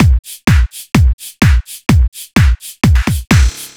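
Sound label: No Percussion, Drum Loops 127bpm; Zero-G; Soundclash Nu Dance Electro; Acidized Wavs; So Straight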